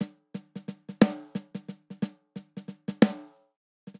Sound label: Swing Six RnB Snare Only 6/8 90 bpm; Ableton; Factory Packs; Drum Booth